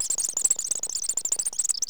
BAT

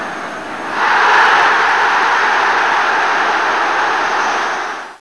roar

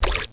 SMAL DRIP, WATER

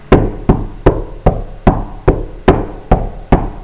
DOORKNO

